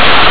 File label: static